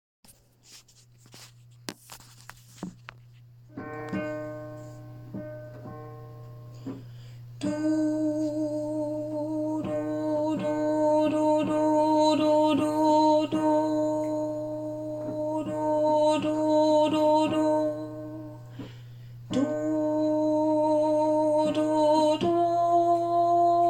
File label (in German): bass a and b2